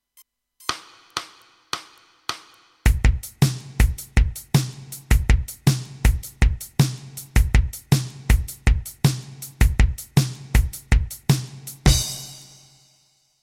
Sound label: blues shuffle